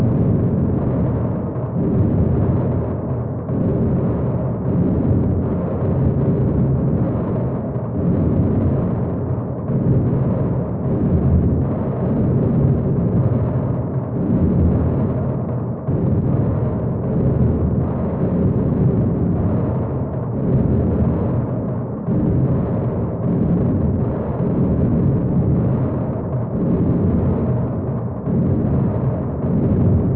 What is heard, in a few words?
Intro Reverb Loop